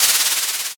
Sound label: paper